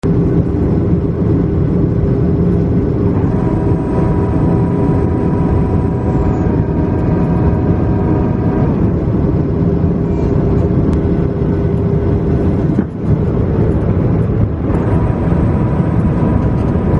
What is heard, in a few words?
flap landing grear extension 737